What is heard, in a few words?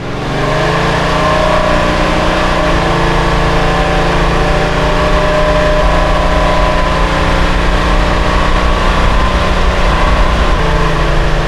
turbo on loop, vehicles limousine